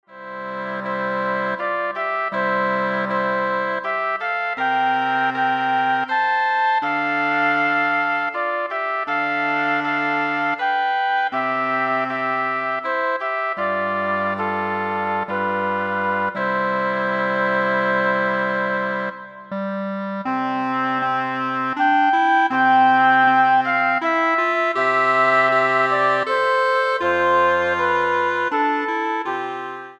Woodwind Ensemble

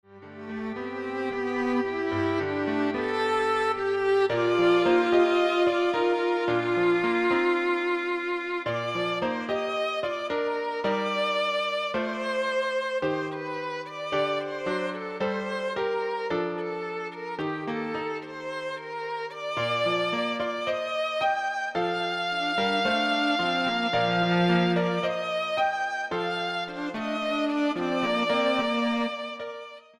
Piano Trio

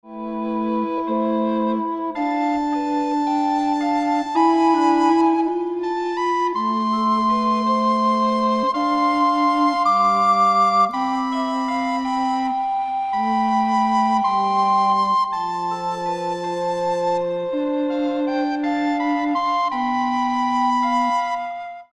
Recorder Ensemble

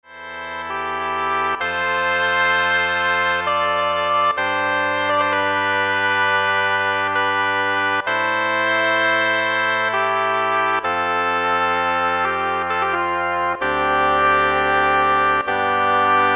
Double Reed Ensemble

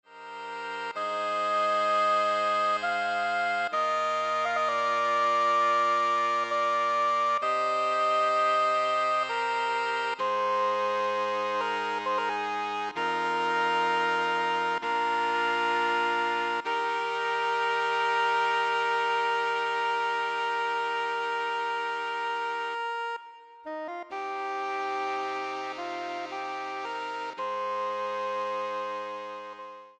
Saxophone Ensemble

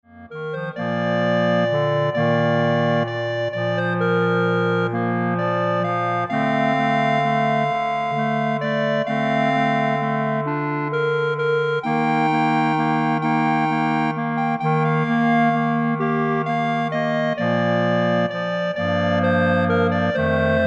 Clarinet Ensemble

